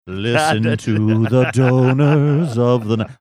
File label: singing listen to donors of the